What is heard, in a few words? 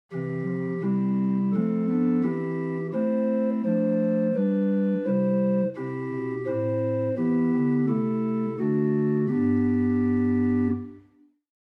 Hohlflote 8, organ stops; Grace Lutheran Champaign